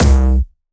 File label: C2, Jumpstyle Kick; vengeance essential club sounds-3; VEC3 Bassdrums